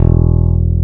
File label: EBASS E1